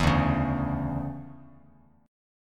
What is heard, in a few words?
D Major 7th Suspended 2nd, Bass Standard; strummed; JGuitar